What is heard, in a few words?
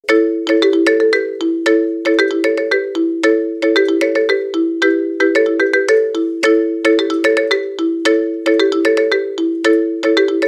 marimba